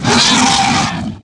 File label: Large Spear Death, Aliens